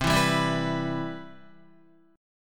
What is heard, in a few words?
C Major 7th, Guitar; CM7 strummed; JGuitar